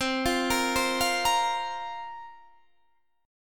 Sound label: C 7th Suspended 4th, Guitar, Standard; C7sus4 arpeggiated